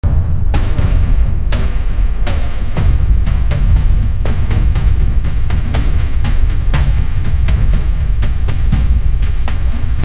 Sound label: Realism music